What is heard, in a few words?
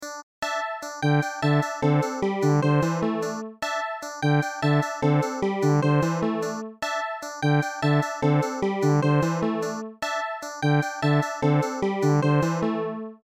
beepbox song